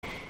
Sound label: cat noise